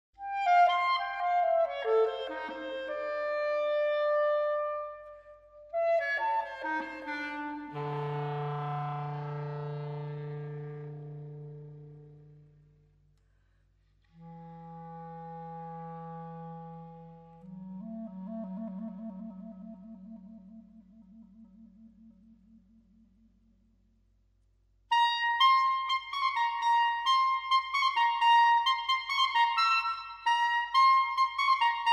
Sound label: Clarinet Solo